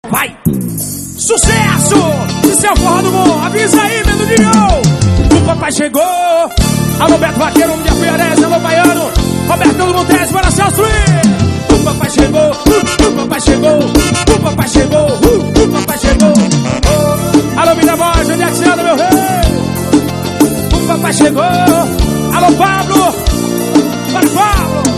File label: forro